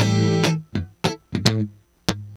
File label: Electric Guitar, Loops; Guitars